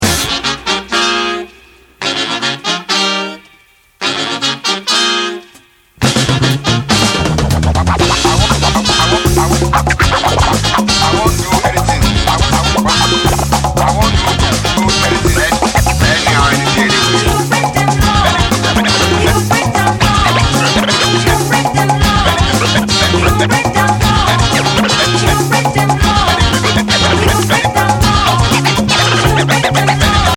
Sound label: Horn Break